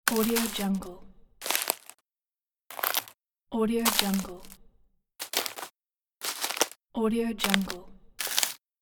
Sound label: crunch trees; bogdansfx